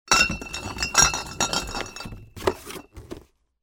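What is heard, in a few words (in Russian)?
ставят в коробку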